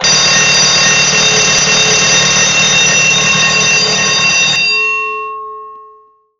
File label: Bell fade